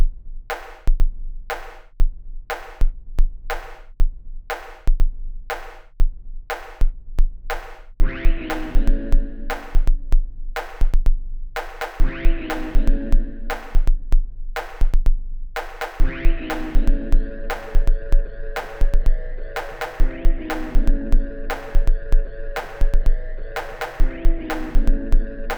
sinewave

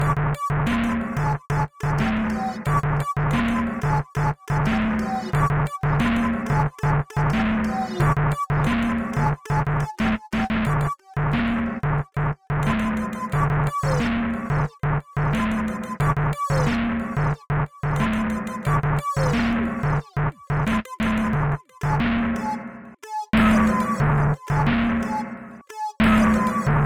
sign wave

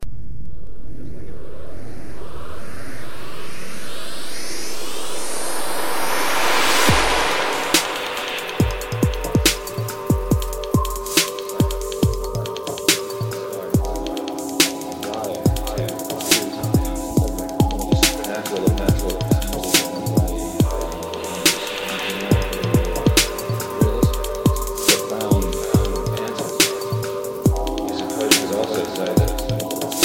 Midi

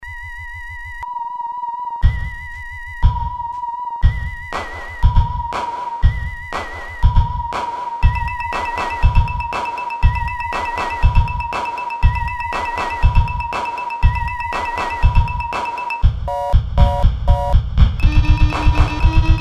Sine Wave

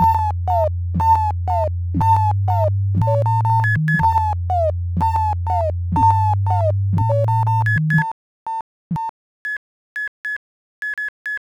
1k stereo

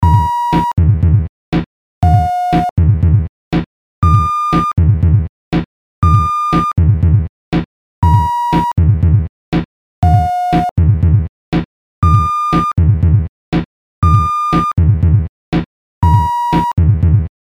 Sine Wave

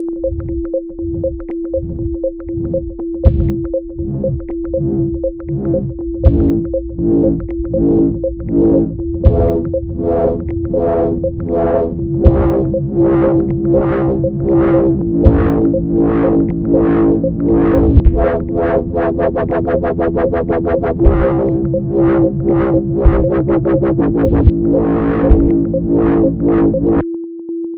Sine Wave

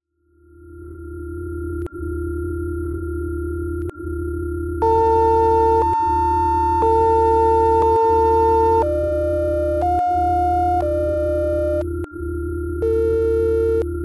Sine Wave

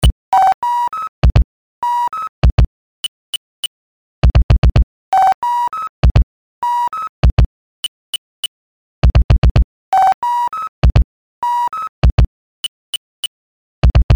Sine Wave Project

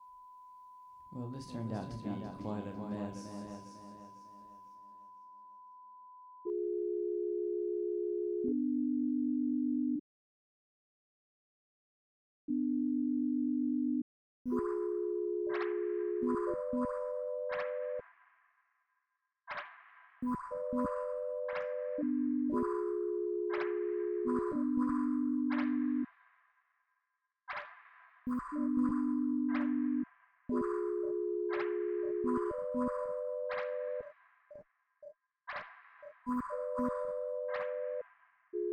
Sinewave Project

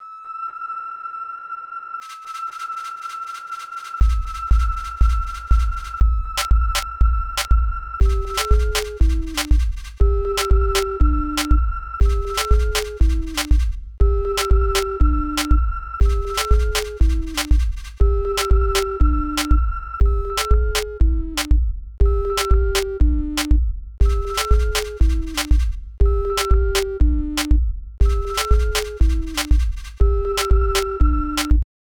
Sine Wave

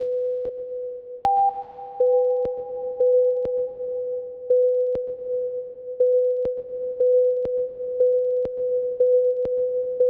signwav

